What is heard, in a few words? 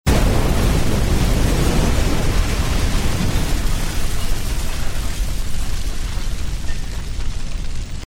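explosion and debris burning long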